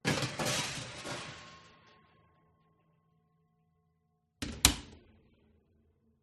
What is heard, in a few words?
baking tray put in oven door close